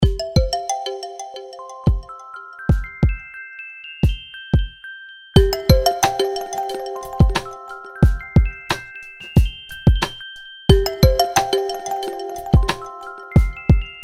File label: Rhythm, ringtones; RhythmBielle; Coolpad